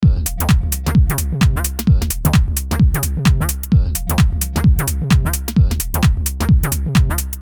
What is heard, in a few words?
130 Fm Groove